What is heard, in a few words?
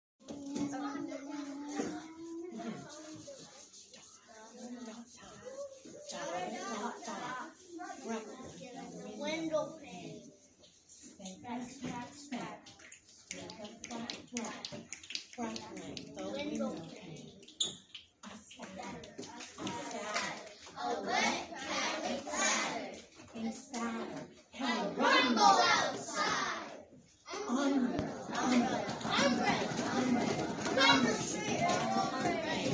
Rainstorm; student example